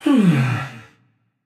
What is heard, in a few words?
ugh, voicepack; smite; jorm